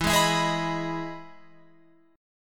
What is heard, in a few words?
E Major 7th E down Guitar Open F; EM7 strummed